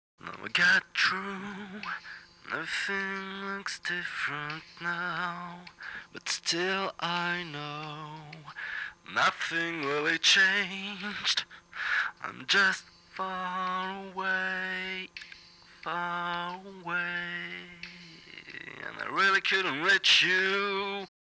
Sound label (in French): couplet voix